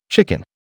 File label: Chicken; on tap ve chu de dong vat